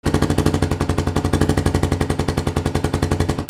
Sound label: VEHICLES